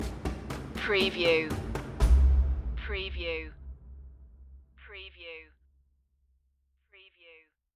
desert caravan stinger

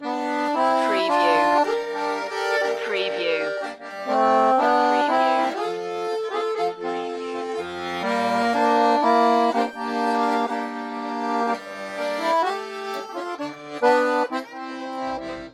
french street musicians loop

